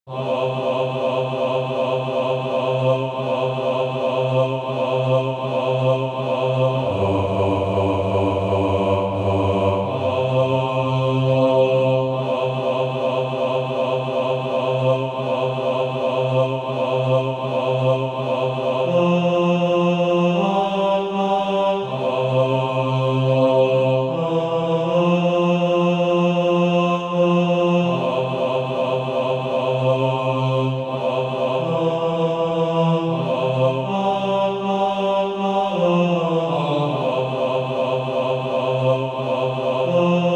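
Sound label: bass, Practise Music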